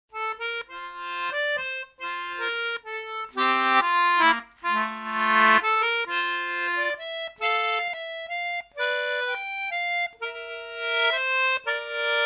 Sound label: tune; Lemon tune; tune compare